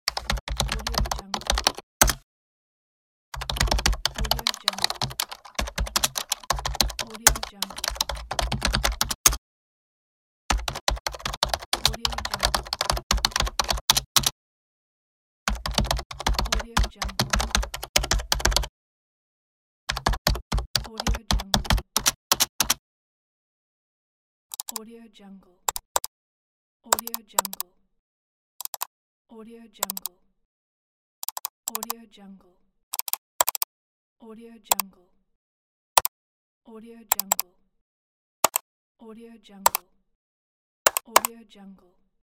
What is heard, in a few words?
keyboard typing and mouse click